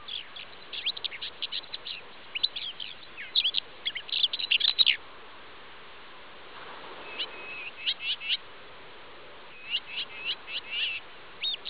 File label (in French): gazouillis